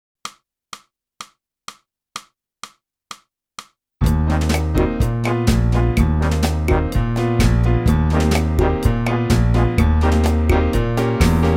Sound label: clarinet; boosey method